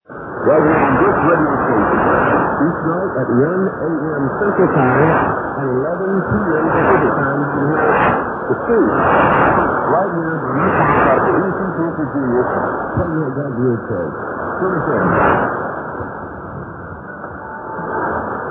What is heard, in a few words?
5755 kHz; KAIJ